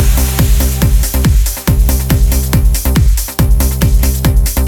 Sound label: dyn